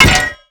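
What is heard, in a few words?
metal hydrant impact; mtl hydrant impact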